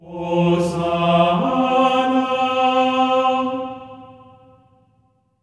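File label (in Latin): hosanna F4; Spectrasonics; Symphony of Voices; GR HOSANA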